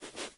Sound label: Broom Sweep; game rip; lethal company version 40